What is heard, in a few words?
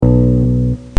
Boing